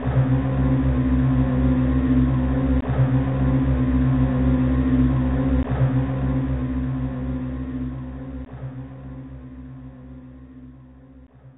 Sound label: Stage Clear; Opening Demo 2, Stage Clear (2nd Part)